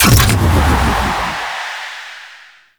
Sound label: Void Dragon Jump Off; Enemies; Gameplay; Warframe; Duviri